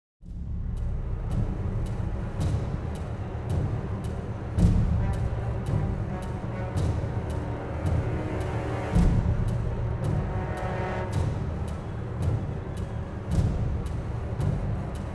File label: Exploration Tension Both Layers